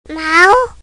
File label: meow